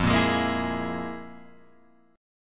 F Minor Major 7th Double Flat 5th, Guitar, Open G

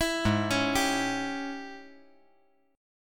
Ab Major 7th Suspended 4th Sharp 5th Ab down; arpeggiated; JGuitar

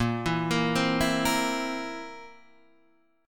A sharp Suspended 2nd Flat 5th; A#sus2b5 Chord {6 7 8 5 5 6} arpeggiated

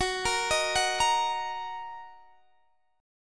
F sharp 6th, down, Guitar, DADGAD

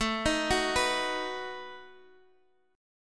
B Major A

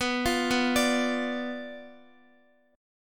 B Diminished B down Guitar; arpeggiated; JGuitar

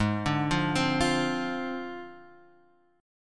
G sharp 6th Flat 5th; arpeggiated; JGuitar

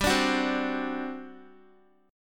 G Major 7th Suspended 4th, G, down, Guitar; GM7sus4; strummed

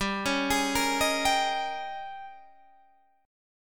D sharp 11th G

